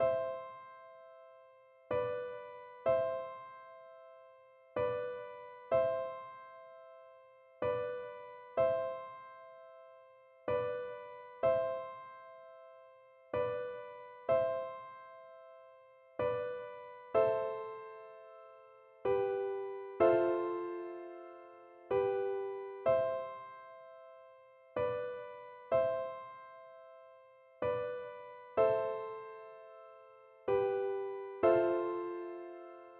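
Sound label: VLC karaoke, Cello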